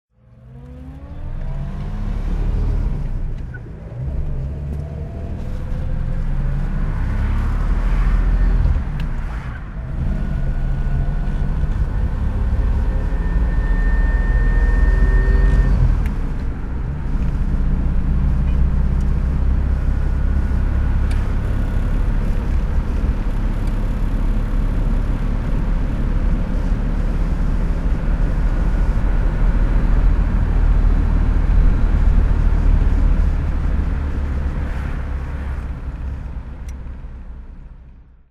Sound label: jaguar Mk5 driving